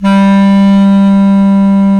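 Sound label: Bass Clarinet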